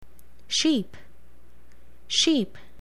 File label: sheep